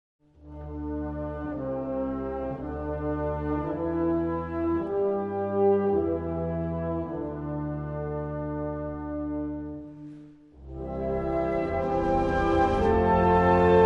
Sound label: National Anthem Japan